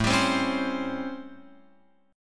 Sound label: A 7th Sharp 9th, Guitar, Open F; A7#9; strummed